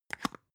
spice jar smooth close creak fast; industrie wirtschaft; gastronomie

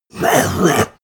monster gasp aggressive; kreaturen

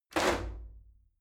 shower slide door open hit regular

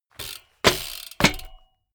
bmx bike pedal grip freewheel

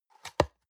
box small open medium

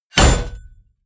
wham, whooshes

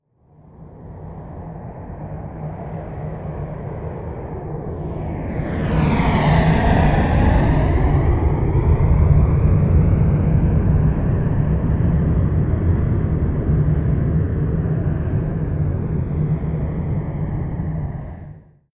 plane cessna private flyby close

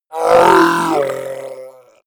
orc call pain long; kreaturen

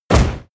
hit sweetener; impacts

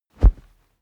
whoosh cloth low soft

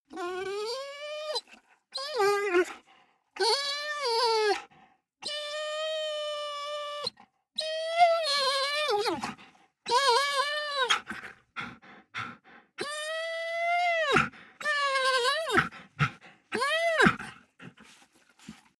dog whine heavy; natur-tiere; hunde

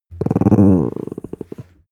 dog small growl short; natur-tiere; hunde

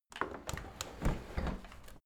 window handle close tilt soft; objekte; fenster

